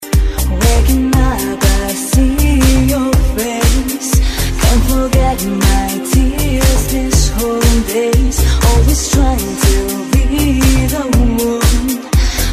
deep house

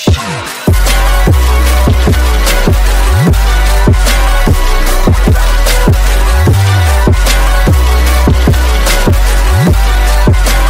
Trap

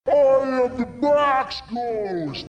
GHOST

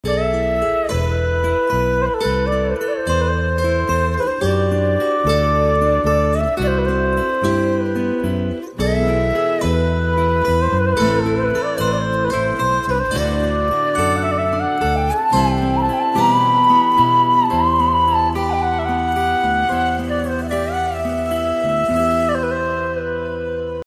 flute

